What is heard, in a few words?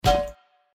Frying Pan; PUBG